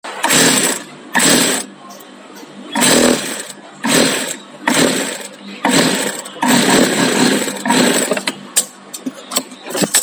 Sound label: Sewing machine; máy may